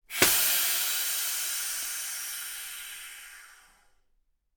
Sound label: AIRBRAKE; SIMUZENSOUND